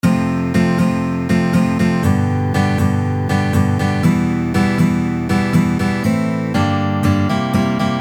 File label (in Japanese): ツーファイブワン; oudo progressions 11patterns